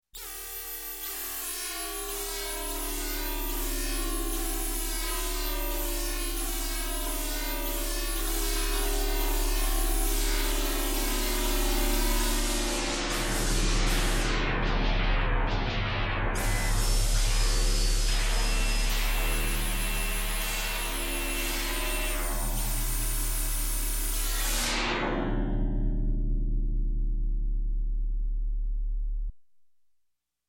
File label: Space Space Rock Opera, Electronic, Music; Sound Ideas 2000 Series